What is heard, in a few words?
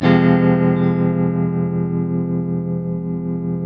TELTMDMIN F#, TELE CHORDS, Guitars; Spectrasonics; Hans Zimmer Guitars VOL-1